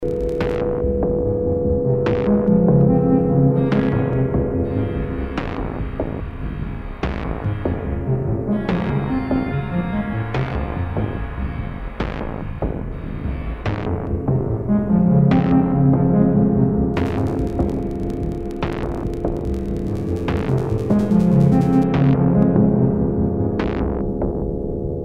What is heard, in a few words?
CRUNCH snippet